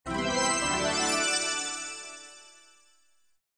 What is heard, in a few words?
win; battle